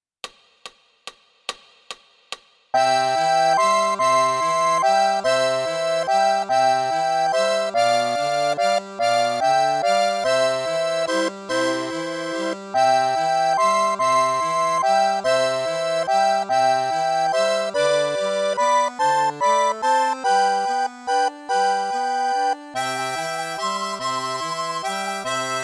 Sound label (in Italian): arpeggio; La Scogliera